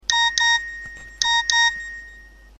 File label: nokia sms tone